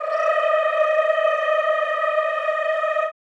CHO Rrrr; Sound & Vision; Gigapack I CD 2; CHO Mmhh + Rrrr

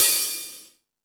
Fusion Stix, E-MU Pro-Cussion

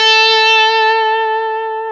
GTR Tube, Dirty; Club-50 - Foundations Roland

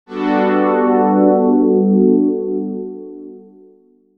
Digi Pads; Jungle Frenzy 1

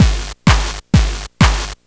Game Boy House Loop, Drums; 8-Bit; Chiptune; Nintendo

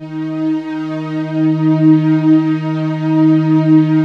PROPHET VS, Vintage Keyboards; Big Fish Audio - First Call Vintage Keyboards

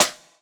Snare, jungle breaks drum sounds

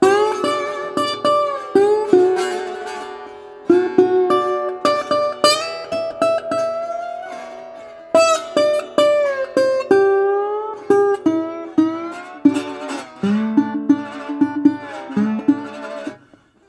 120, Blues Guitar; ILIO - Fretworks

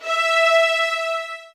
E, soft violins; Q Up Arts; Denny Jaeger Master Violin Library

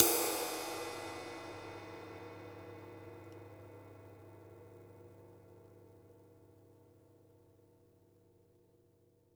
flat ride; JazzFunkKit

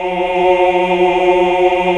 OOH, Ooh Uni; Sound & Vision - Gigapack II CD 1 (Roland)

